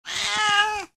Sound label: Stressed cat wail; animal